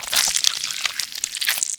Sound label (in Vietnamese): Tieng mau chay ra rat nhieu va manh